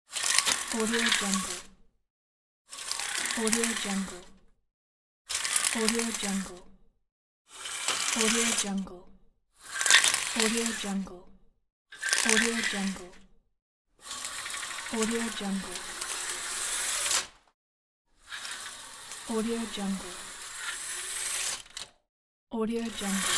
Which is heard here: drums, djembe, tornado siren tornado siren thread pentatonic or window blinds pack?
window blinds pack